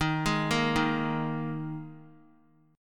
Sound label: Eb Suspended 4th Eb 3C 3F 3A 3D; Ebsus4 arpeggiated; JGuitar